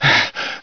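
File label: player hurt; cstrike; codmw2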